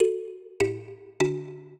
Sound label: minuet, mbira; Mozart